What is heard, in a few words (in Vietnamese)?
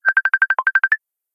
Nhac chuong cuoc goi Messenger